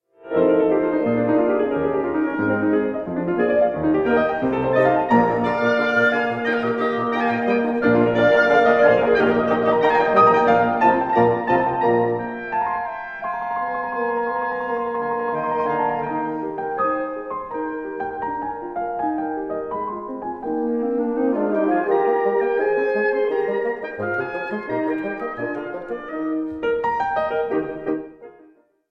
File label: quintet for piano and wind 3rd movement; Wolfgang Amadeus Mozart